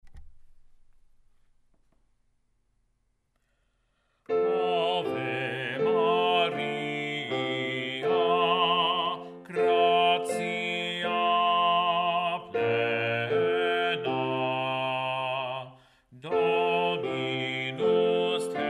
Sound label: Ave Maria Bass; Ave Maria, J. Arcadelt; Choral Resources